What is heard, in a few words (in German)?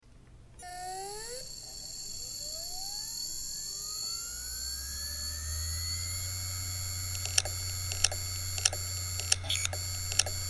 Samsung HD103SI klopfgeraeusch